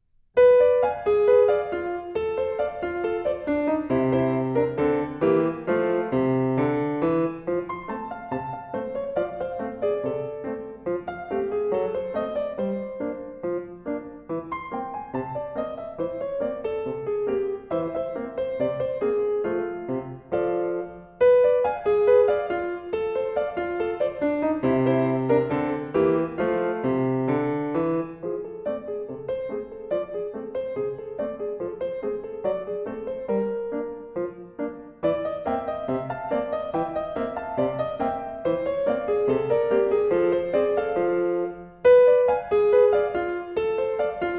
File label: Honky Tonk Piano Rag